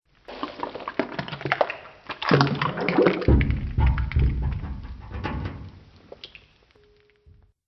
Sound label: Arcane Magic Cauldron